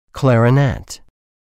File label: clarinet